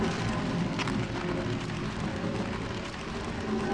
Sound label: block push; Ben 10 - Omnicode